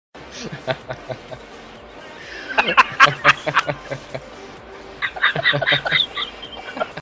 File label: laughing; cupcake and pepper